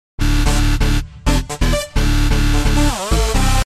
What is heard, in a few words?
electronic, ringtones